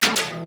METAL SNARE HUM FLAM; M8

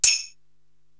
Rap Sleighbell, Korg TR-Rack drum machine

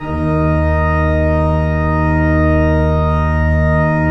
PIPE, 3DOrgans

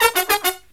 Killer Brass Riffs, 108 soul

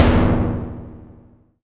Voyager Perc, Moog Voyager

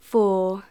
Female vocals and robo-vox

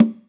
Conga; Equipped Music; Loungin House

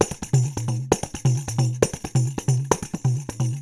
percussion loops 132; Sampleheads; NYC Percussionworks; TI LOOPS 132

